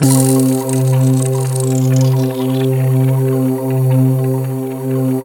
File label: pad C, Synth Pads, Chillout; Best Service ProSamples vol.36